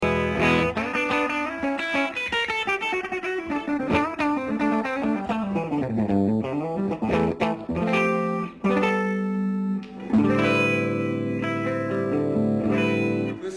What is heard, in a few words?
Kustom Hv 100 sound clean; lampowy preamp; comba gitarowe